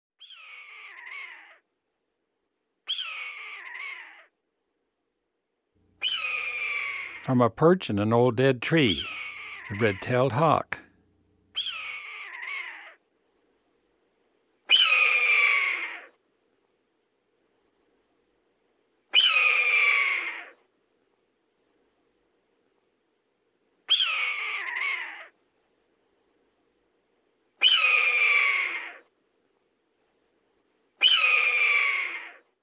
Red-Tailed Hawk; Eastern North America; Dan Gibson; Wildlife Identification By Sound

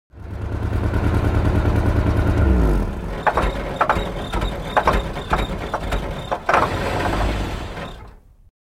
Engine Shut Off With Long Dieseling, Cars

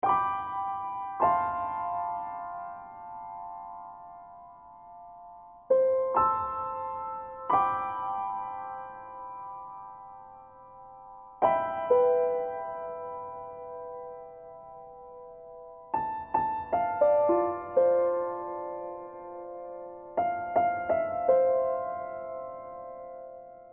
Piano

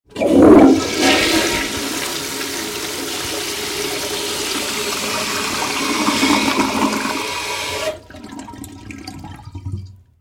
Toilet Flush Old Type, Household

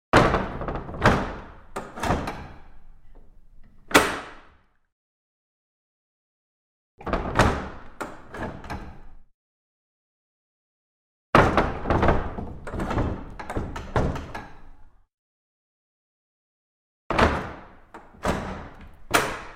Large Wooden Window Shutters W; Hollywood Edge Historical Series; cd4 Castles Palaces & Monasteries

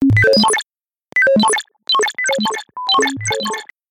tones

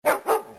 dog

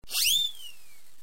WHISTLE

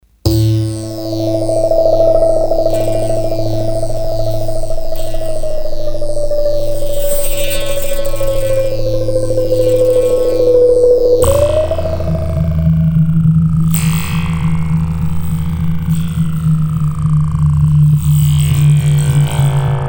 Big Droner, Drones; Best Of N2O Library; Drones & Foundations

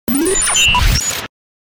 Pin Ball Chaos, DJ sounds

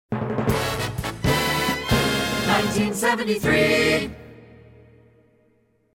Hits, Drops

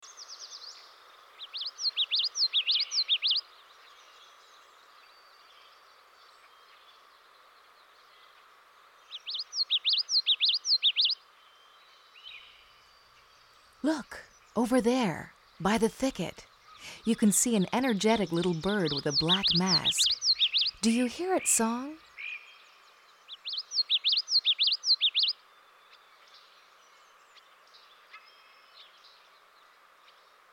common yellowthroat

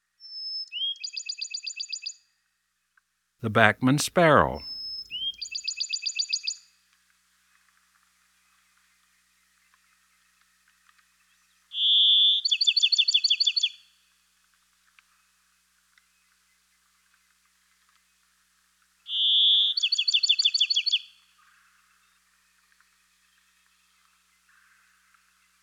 Bachman's Sparrow; Eastern North America; Dan Gibson; Wildlife Identification By Sound

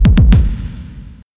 impact

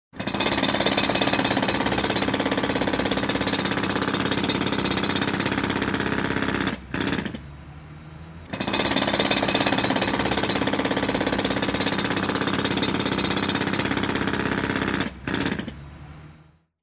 Jack hammer; SOUND EFFECTS1

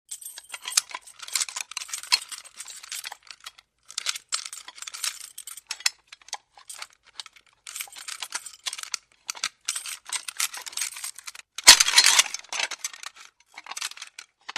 Shackles Unlock & Rattle; Hollywood Edge Foley Sound Library

